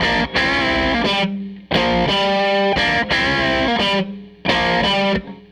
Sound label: Tele Guitar Loop